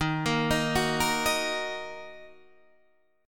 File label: D sharp Minor, Guitar, Half Step Down